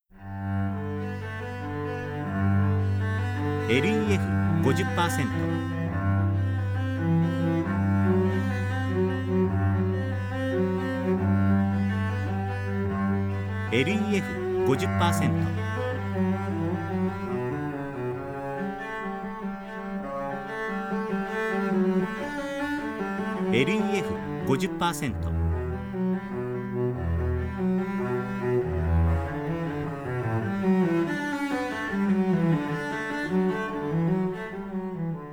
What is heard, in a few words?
strings